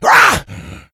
shoved, infected

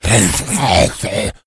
become alert, infected; npc, alert, becomealert

